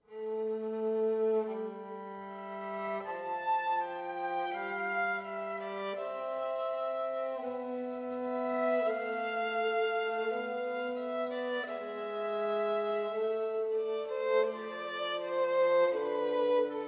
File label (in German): Quintett in a fuer drei Violinen, Bratsche und Cello; Instrumentalkompositionen; Paris